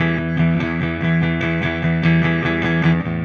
Silos Clean Strum Guitar, Indie Rock

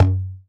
PERC, Dance Mega Drums; Best Service; PERC-MIX

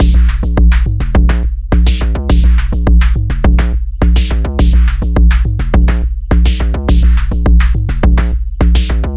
Sine Wave, Processed Percussion

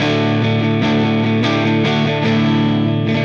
Silos Dirty Strum Guitar, Indie Rock

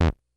Bleep B, Drum Machines, Korg DS-10

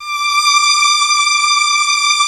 D5 hi, lush strings; InVision Interactive; Lightware VOL-1; Instruments & Percussions

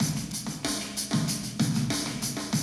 Classic DrumLoops

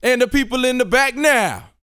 VOX F, Vocal Planet R&B & Dance HIP-HOP; ILIO

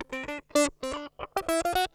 SQUEEZER, Funk Guitar; 90 s Sample CDs; Zero G

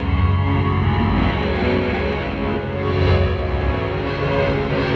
Fat Loop, Electric Guitar; Sonic Foundry (Sony Creative Software) - Friendly Fire

